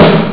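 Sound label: snare drum; Amiga Soundtracker Sample Packs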